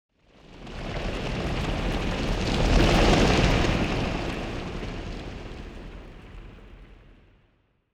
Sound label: door op debris